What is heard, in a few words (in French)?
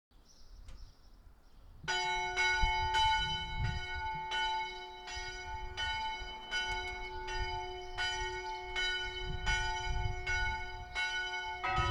Cloches totales